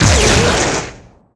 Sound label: Light Blast; Seer; GamigoNA